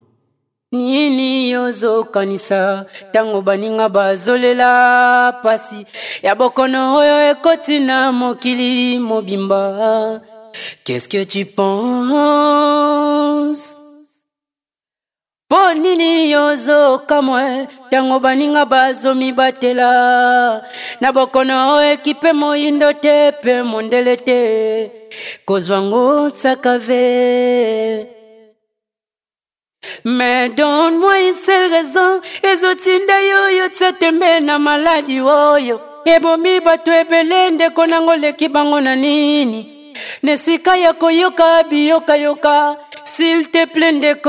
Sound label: Short Accoustic